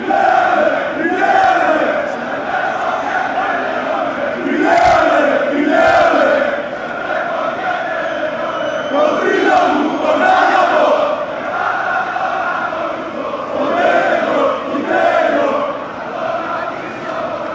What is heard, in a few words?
olympiakos shouts